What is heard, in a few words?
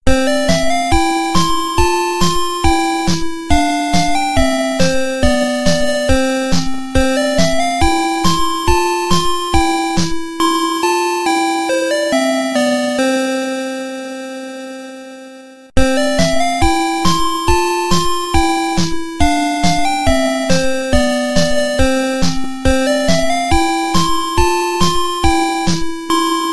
I am a Little Teapot Ice Cream Truck Version